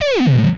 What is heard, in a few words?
Sci Fi Gun, Blast; Space Blasters2D